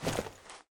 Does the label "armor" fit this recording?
Yes